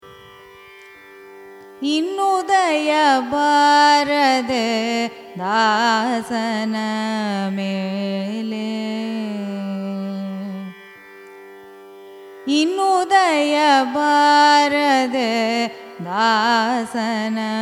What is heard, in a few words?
raga kalyana vasantham carnatic